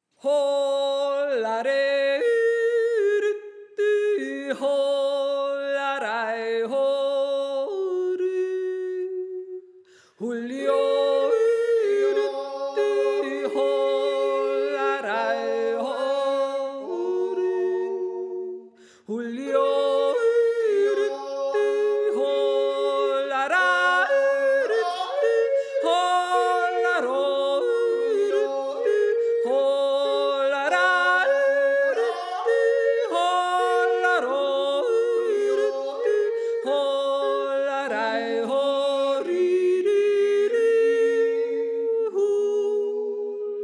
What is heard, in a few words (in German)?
Sopran; Seckauer